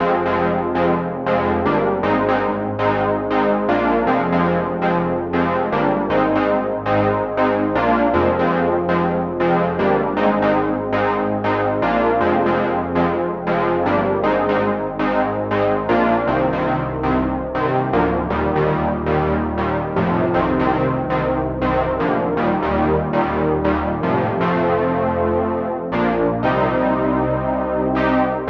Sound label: Anthemic Brass 2 wet